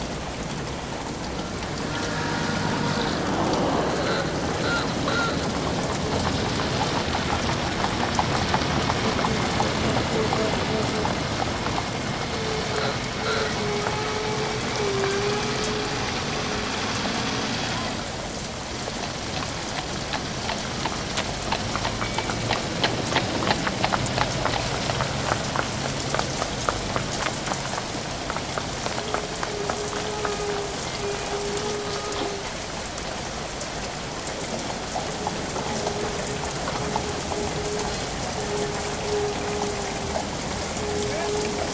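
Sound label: London street exterior day; the 39 steps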